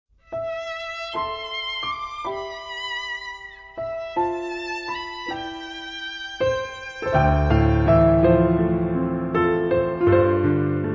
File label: ballad